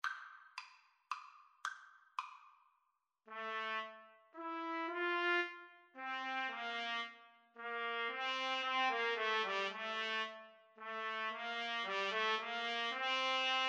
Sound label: trumpet duet